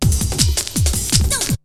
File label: BREAKBEATS, TECHNO